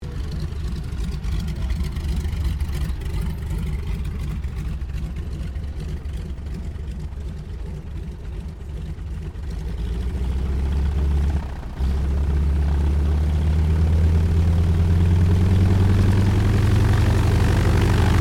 AIRCRAFT CAC CA-25 WINJEEL SHUTDOWN PROPWASH, fighter trainer; RAAF Winjeel; Airplanes (Sound Effects:Vehicles)